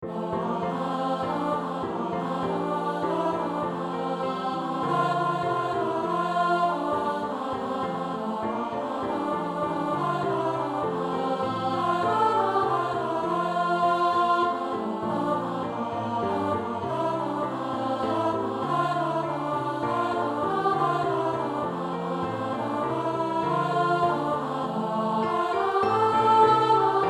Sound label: Alto; Infant Holy Infant Lowly